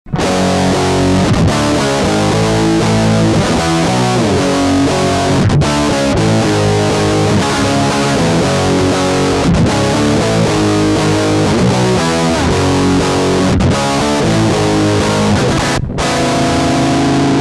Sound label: GRUNGY